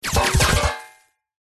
bullets several hit tank or metal and ground in quick succession; zapsplat